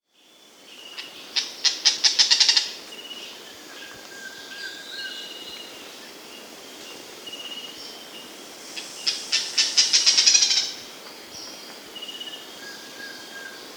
Lineated Foliage-Gleaner (Syndactyla Subularis), Andean Birds; S. America